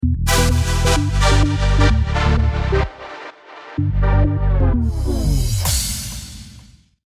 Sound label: deathcam